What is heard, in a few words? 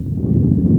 HOUSE QUAK, Creaks & Quakes; SFX